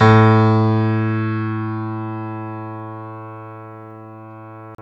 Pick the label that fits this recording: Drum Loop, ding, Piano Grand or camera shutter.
Piano Grand